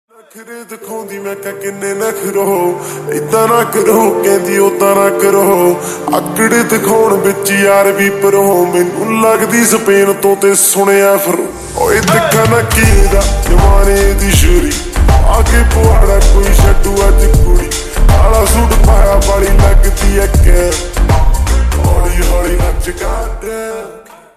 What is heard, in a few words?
Slowed Reverb Ringtone